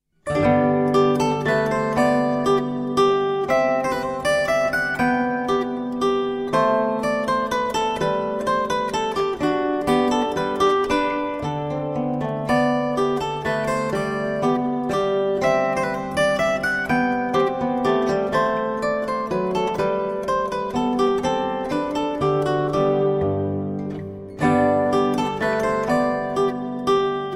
Menuett in G Dur, zither

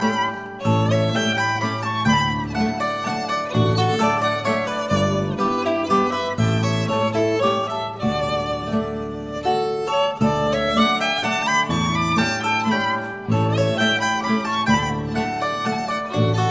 Steirische, zither

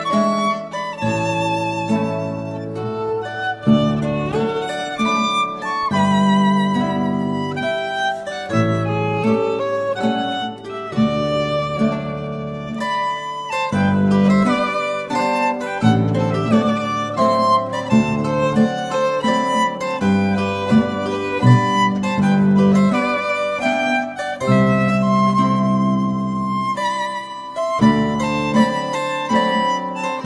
Landler, zither

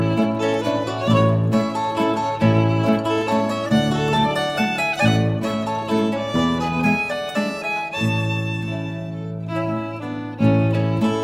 Vorgeig Landler in A

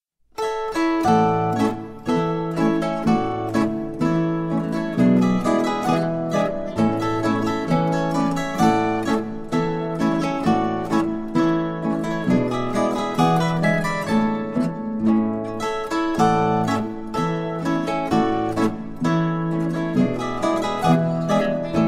Laurenzi Boarischer, zither

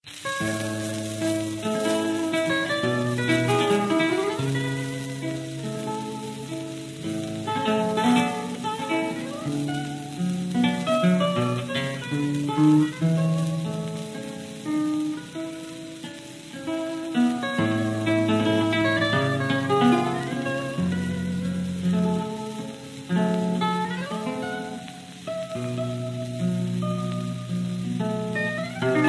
lied ohne worte, zither

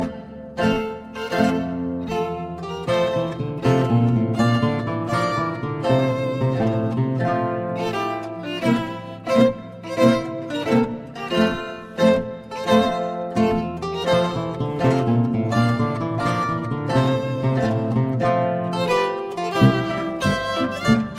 Marsch, zither; Drei Wappen Marsch

